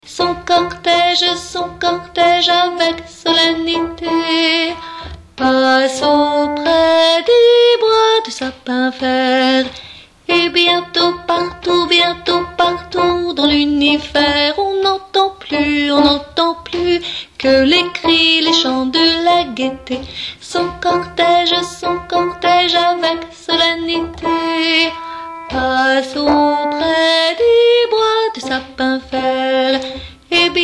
fanfare alti; Fanfare du printemps 2

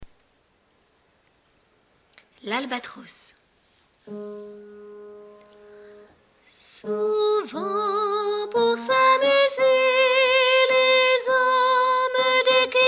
l'albatros, tenor

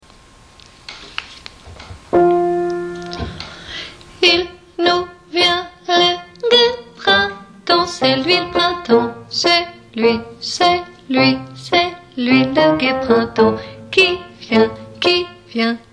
fanfare basses

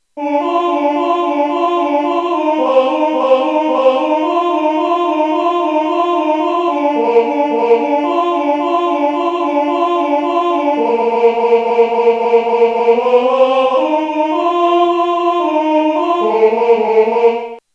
fanfare tenors; midi

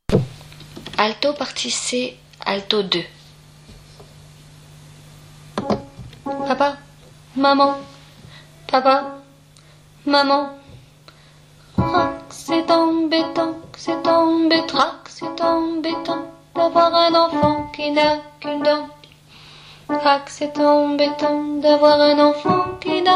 voix d alto; partie C voix 2; Dans la troupe

